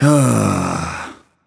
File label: nv sigh; smite; voicepack; mercury